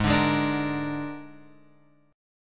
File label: G sharp Suspended 2nd Flat 5th, Guitar Open F; G#sus2b5; strummed; JGuitar